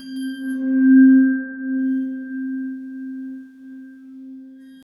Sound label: cinematic sound effect, glass harp